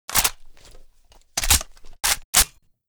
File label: aksu reload; STALZONE